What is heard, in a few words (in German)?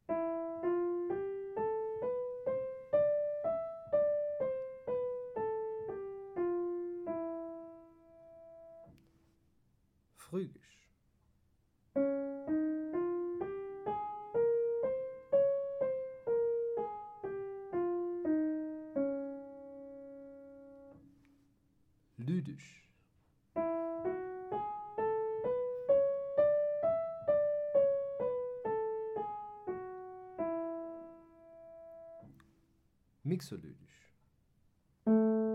Skalen; Gehoerbildung